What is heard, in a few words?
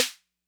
Snare Accent Max Tone Max Snap, 808; classic machines